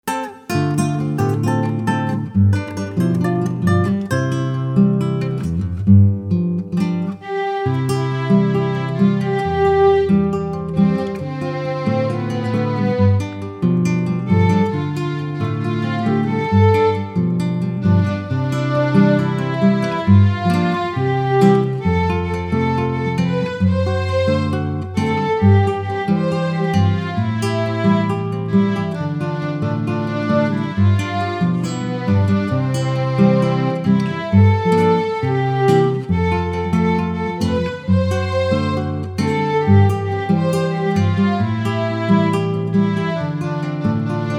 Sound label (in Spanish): Pista con melodia instrumental